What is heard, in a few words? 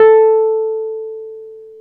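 piano, Wurly; Roland LCDP10 Keys of the 60s and 70s 2; Wurly 200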